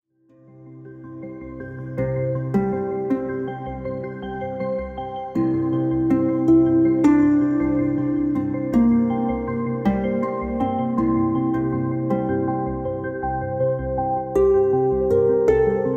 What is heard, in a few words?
Dream Harp